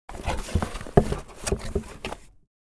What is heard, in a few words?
Objects office cardboard box scratching and crunching